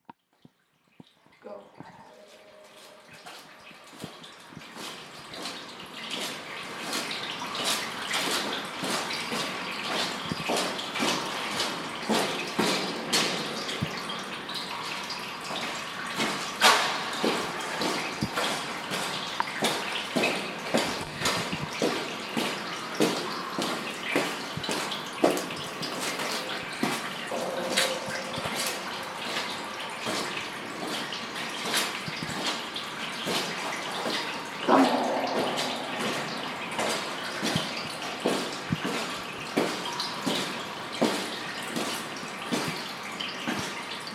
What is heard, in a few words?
old water treatment plan